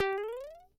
Super Mario Jump High; SMBW

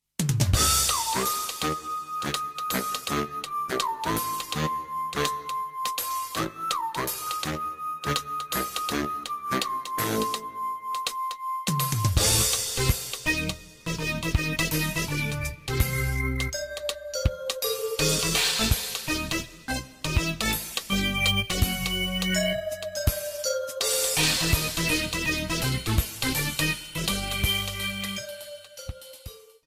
Horror Manor Inside

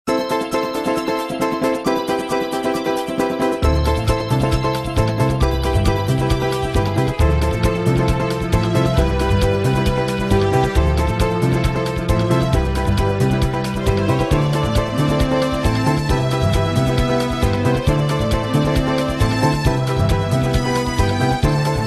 Music; SM64; Wing Cap

